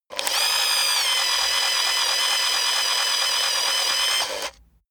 servo cry rattle on off

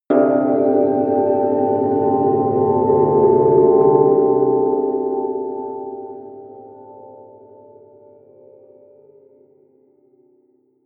bell pad

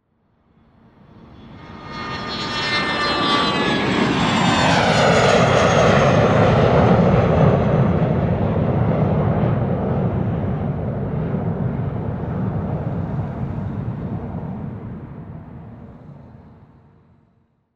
small dc 9 jet taking off

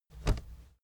aluminium case steps

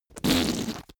whoopee cushion fart juicy

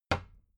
cabinet door close regular

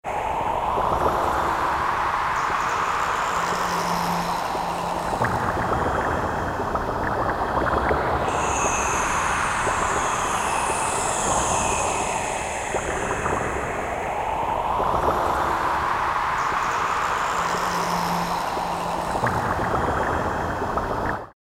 layer psy ambient

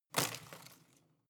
home plant leaves rustle hit

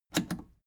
plastic button scratch smooth click enable fast

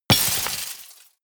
glass smash debris

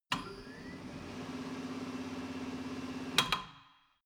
tire changing machine turning platform latch long

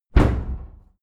cupboard close hard

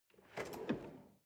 drawer large draw short

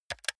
console controller r1 press fast hard

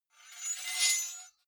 sword blade screech high

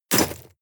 shovel dig impact hard

